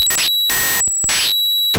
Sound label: Raw Glitch